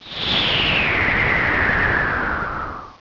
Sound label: Prismatic Laser; pokerogue; battle anims